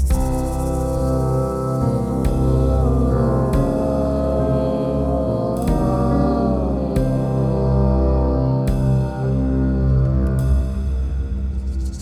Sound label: Harmonizing; BB 2HTC Samples Vol 4